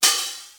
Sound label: Open HH, DL-909